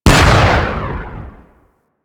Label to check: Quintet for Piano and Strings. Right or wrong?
Wrong